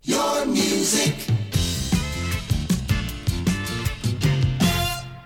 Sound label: topformat maxi jingle single